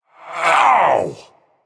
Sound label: ouch; smite; agni